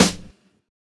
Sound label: Snare